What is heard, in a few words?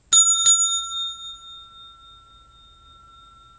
Service Bell